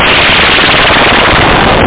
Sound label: bass C